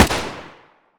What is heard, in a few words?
aks74u fire